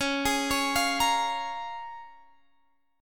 Bb Minor 7th Db

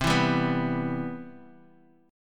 C Major 7th, Guitar Open D

